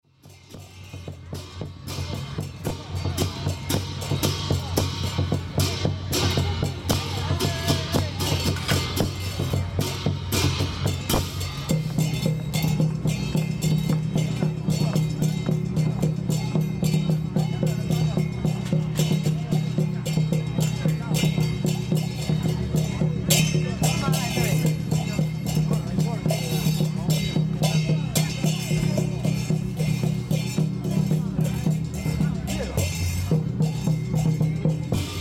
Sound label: fan dancing drums